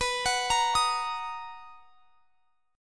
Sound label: B Major 7th B down; arpeggiated